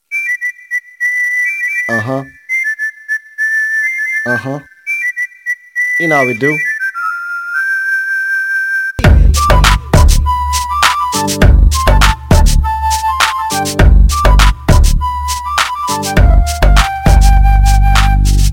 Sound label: flute beat mix